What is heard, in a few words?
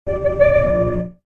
metallic scream